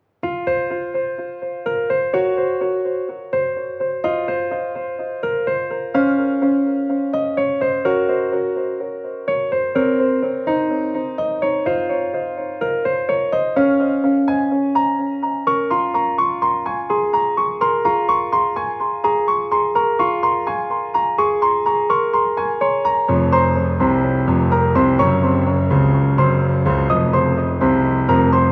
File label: Piano